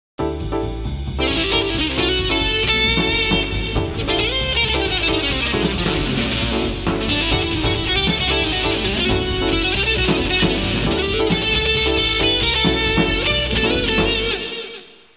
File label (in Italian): suonerie musica jazz